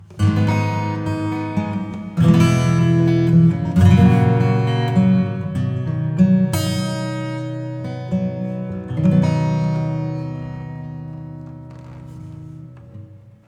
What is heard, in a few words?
Reverb Acoustic Cinematic Rooms